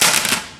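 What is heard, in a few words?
physics clipboard impact small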